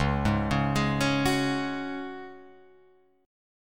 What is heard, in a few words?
C sharp Suspended 4th C sharp down Guitar Open F; arpeggiated; JGuitar